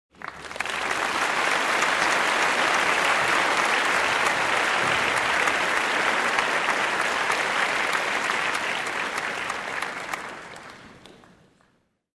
Performance Warm of Applause

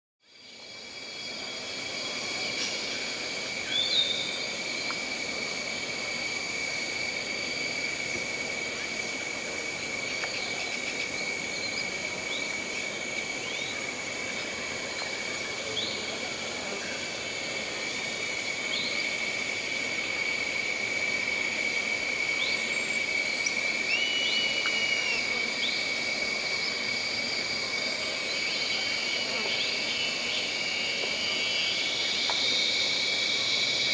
Forest on hot summer day

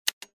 Single key press on keyboard

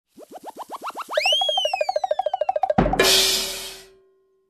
Comedy Punchline drumroll

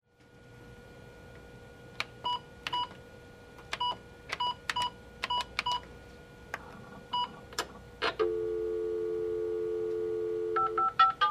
Fax Sequence

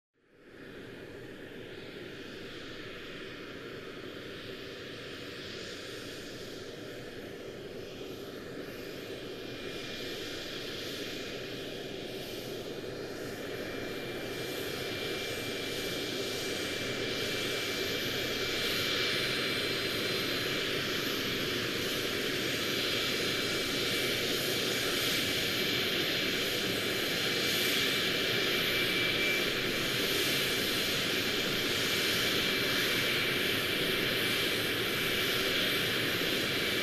Aircraft Ground Rolling

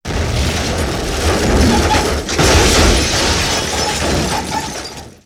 Explosion with multiple types of debris scattered

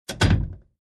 Cabinet Door close

